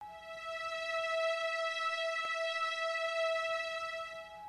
Stringz

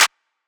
Clp, Claps

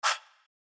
Vox

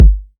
808's

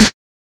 Snares

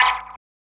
Perc, Cowbell; Syrup God

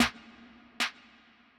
Escape Snare; Snares

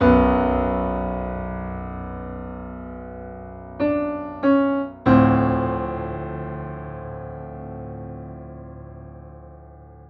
Loop

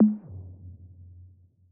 Perc

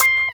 Bird Synth; Sylense

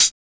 Closed Hats

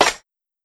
Clap

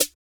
snare one shot; Snares

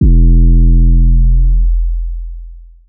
808s

